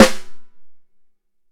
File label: Ludwig Snare; EMU PX-7